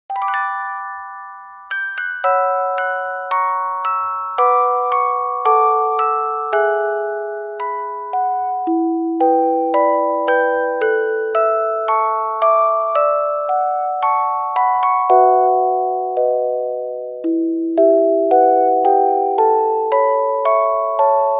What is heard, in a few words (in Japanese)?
orgel